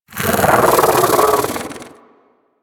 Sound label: bug vocal alerted; Warframe; Hunting Critters; Inf K Drive Bug